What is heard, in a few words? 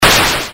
explosion; Asteroids-Reloaded